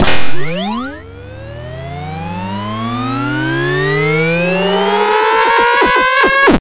button puck travel

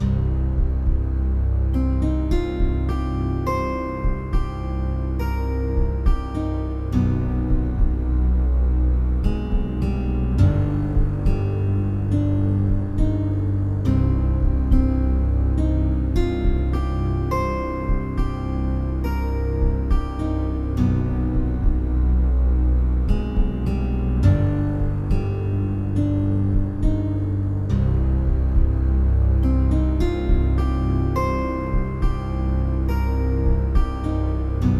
Theme Loop; Max Payne 3; ps3; xbox 360

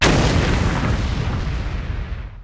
Death Bomb

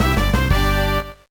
Unknown Jingle; mario tennis power tour

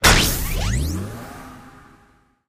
Evil Spawner Dispense; candy crush saga gamerip

